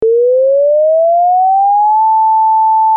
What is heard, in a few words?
panned